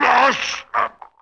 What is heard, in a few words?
Giant Die